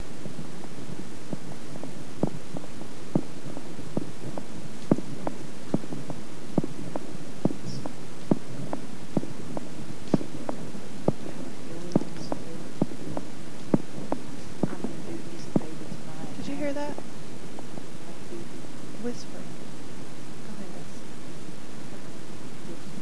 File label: heartbeat whisper